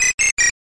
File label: flower jingle; gma-7-logo